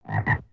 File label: frog